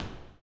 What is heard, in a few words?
STOMPER; Spectrasonics Vocal Planet